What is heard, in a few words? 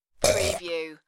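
Quick Choke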